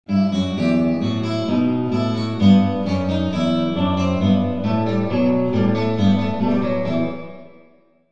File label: guitar concert; reverb demo